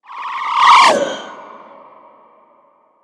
Whoosh

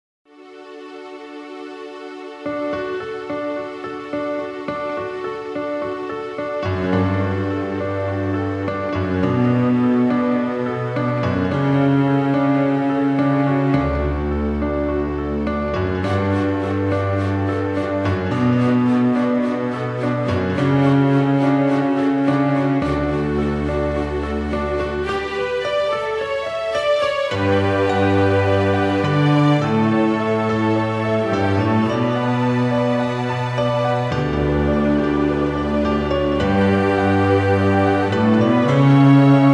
Piano song

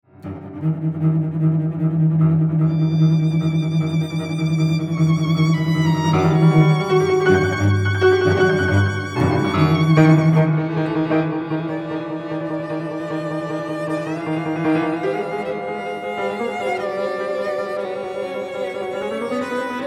Concert Music comtemporary

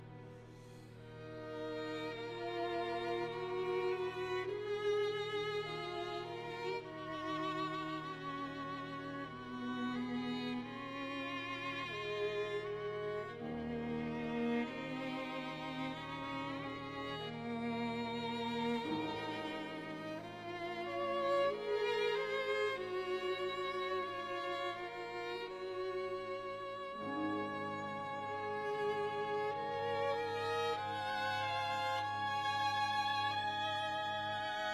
Double Concerto Vln-Vla excerpt

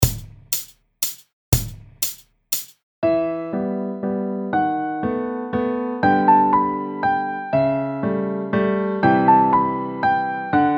WALTZ IN E MINOR

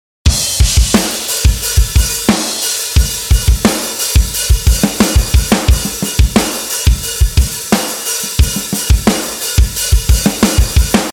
177 POP PUNK LOOP GHOST NOTE; WARPED TOUR

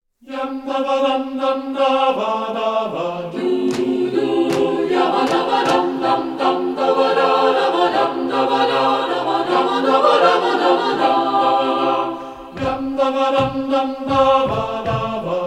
Intrada a cappella; L. Maierhofer; nach einem Instrumental-Thema aus dem 17. Jh.; Klassische Themen arrangiert von L. Maierhofer

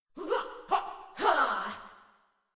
Princess Attack VOX

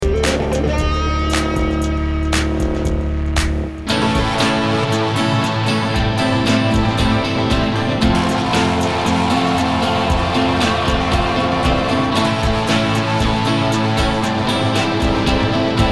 90's SYNTH FUNK

